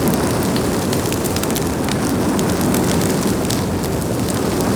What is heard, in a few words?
Flame Thrower Loop 3D; Weapons